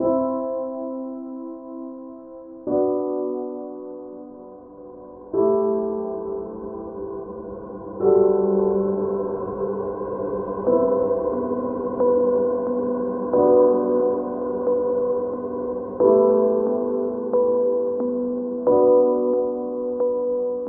piano, sad

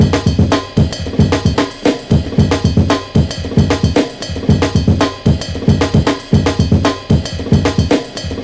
Drum loops Funky

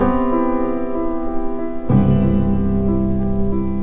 piano, Sad Loops

